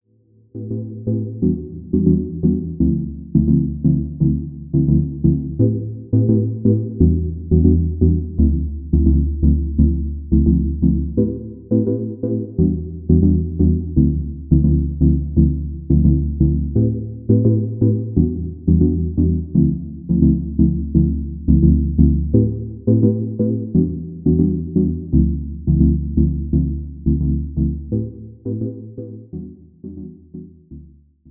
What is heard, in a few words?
Jupiter 8